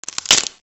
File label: crackle